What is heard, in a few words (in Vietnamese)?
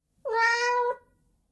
con meo keu meo meo 1 lan